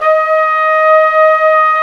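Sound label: WND, Hybrid Winds, Flute & Flugel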